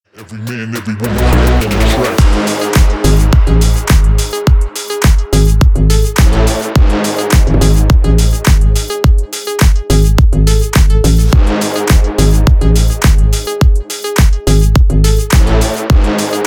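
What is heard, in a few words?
loud club cut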